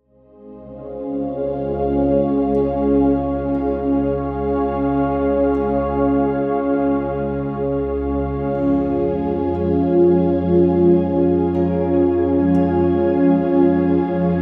Deep Relaxing

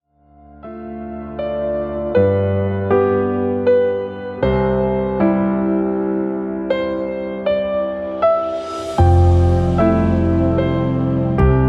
Relaxing

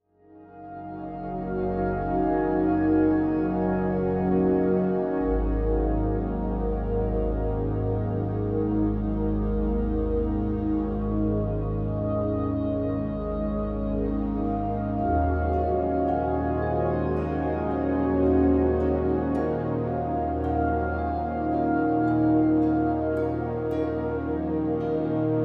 Deep Meditation

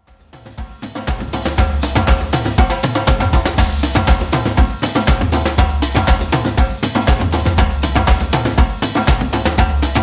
Workout

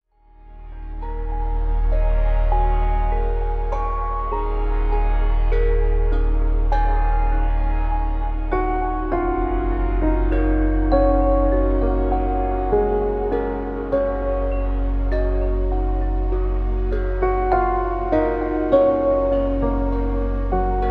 Deep Relaxation

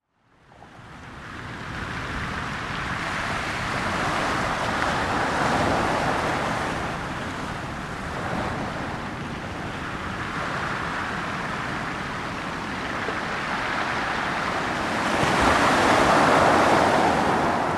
Sleep Ocean

